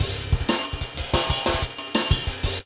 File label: Acidjazz Drummers